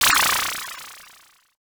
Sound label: boss move; Season Land